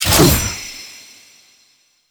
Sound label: Glaive Throw; Enemies; Weapons; DE; Warframe; Teshin